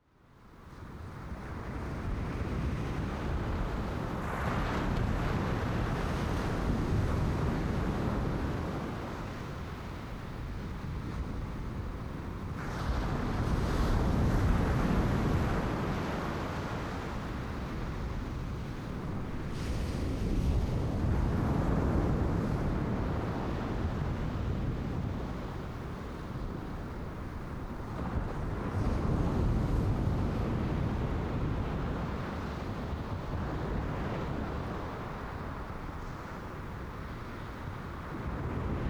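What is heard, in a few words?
Wave_3, ocean wave sounds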